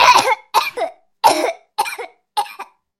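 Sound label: dry barking cough fit, child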